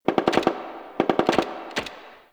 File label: Distant Gunfire; Warframe; Nineteen Ninety Nine